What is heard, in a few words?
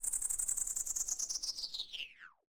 cicadas rev down fx